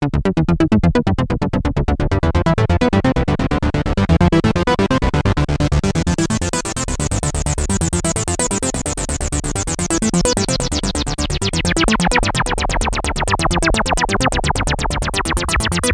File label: midibox sid v2 cv out